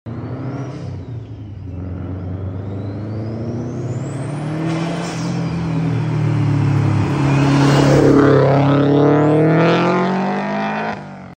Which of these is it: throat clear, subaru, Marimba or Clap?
subaru